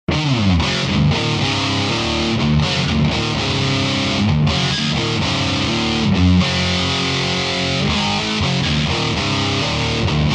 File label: badcat double gain